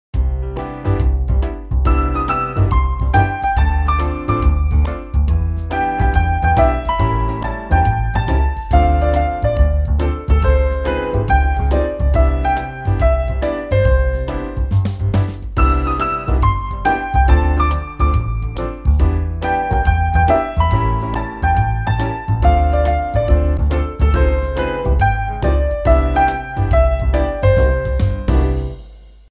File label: Jazz Piano; i-mobile i-style 3; ringtones